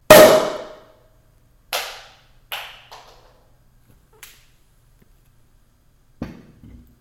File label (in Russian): yarkii zvonkii v prostranstve